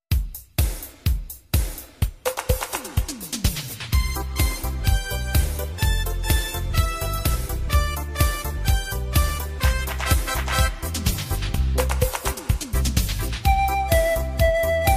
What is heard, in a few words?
beat